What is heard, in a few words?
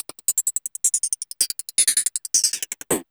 LOOPS